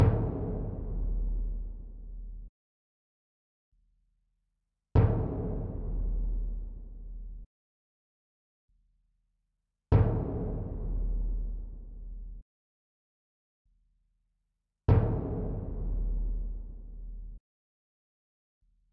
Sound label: Timbani hit; gamerip